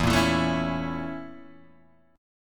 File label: A sharp Minor Major 7th Flat 5th